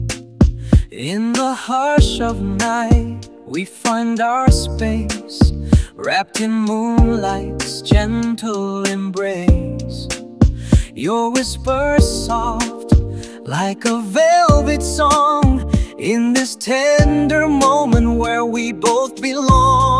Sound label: jingle; minimax-music-1.5; ai jingle maker